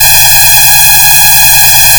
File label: fm cello single; cosine; FFTimbre